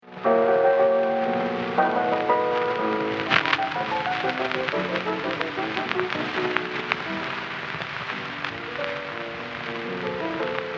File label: Grieg Menuetto